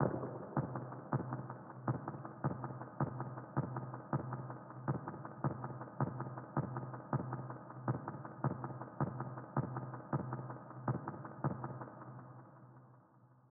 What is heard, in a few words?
Percussion